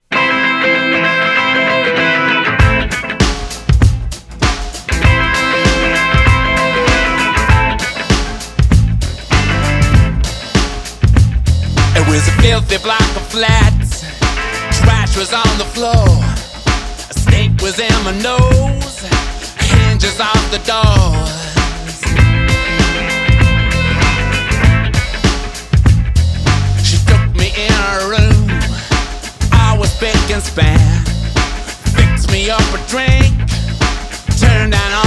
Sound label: Rain Fall Down